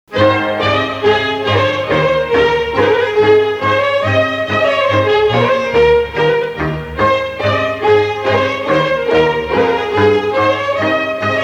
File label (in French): Hora - Roumanie